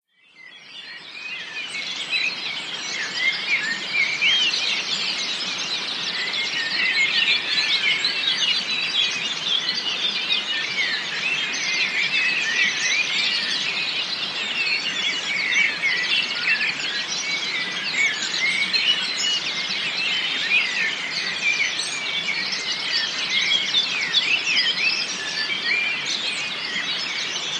ANIMALS-BIRD BGS RURAL FRANCE: Dawn, dense bird song, river in background, France. Rural French atmosphere; Beauvene